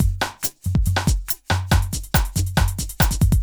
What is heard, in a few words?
ind 140 kit